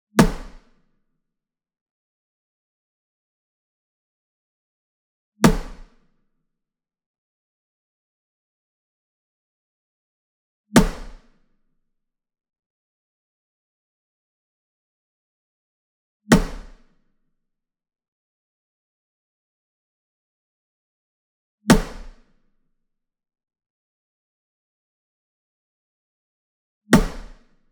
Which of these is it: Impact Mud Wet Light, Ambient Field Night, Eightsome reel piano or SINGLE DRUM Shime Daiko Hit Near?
SINGLE DRUM Shime Daiko Hit Near